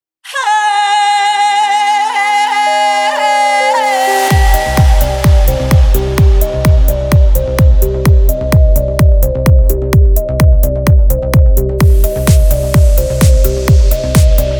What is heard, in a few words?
Instrumental